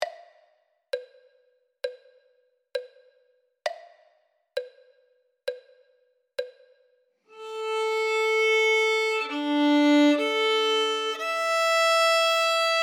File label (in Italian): violino I